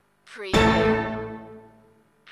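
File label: Casino Slot Machine Orchestra Stab; lynne-publishing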